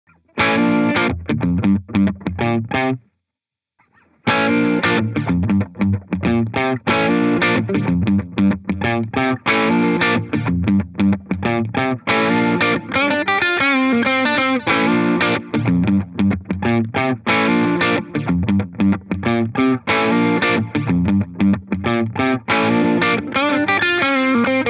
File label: Tape Old Tape, echosystem